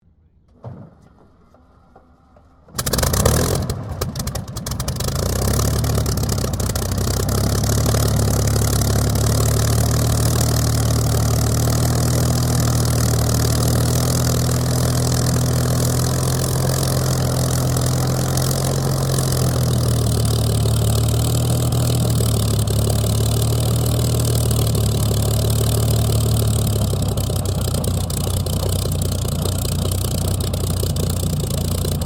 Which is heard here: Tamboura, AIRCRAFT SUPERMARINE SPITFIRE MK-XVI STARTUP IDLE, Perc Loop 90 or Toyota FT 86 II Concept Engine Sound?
AIRCRAFT SUPERMARINE SPITFIRE MK-XVI STARTUP IDLE